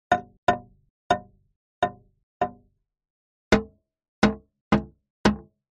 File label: impacts metal thuds muted on rusty old bulldozer various contact mic